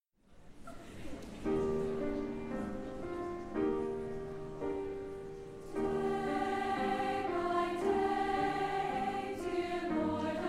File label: choir